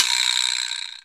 PER Percussion

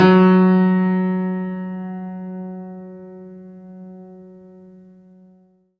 F#3, Synth Line; 90 s Sample CDs; NiceBeats; SynthLine VOL-22

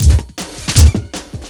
LOOP FX, Big Beat Drumloops

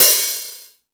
Sluggo Drums, E-MU Pro-Cussion

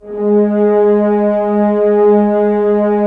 Horns; Roland - LA Composer vol. 4

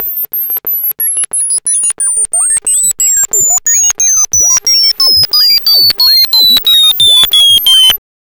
SONAR, Hard & Loud Techno; USB Soundscan vol.01; AKAI

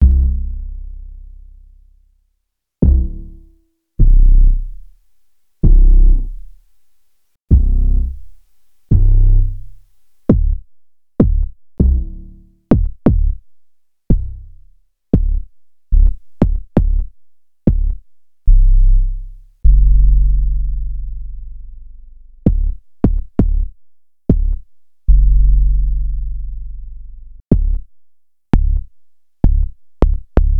Bass High Quantity

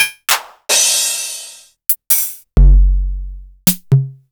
Korg Electribe ER-1 Kit; Sliced

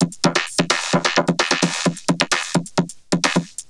Vocobeat; Sonic Foundry (Sony Creative Software)

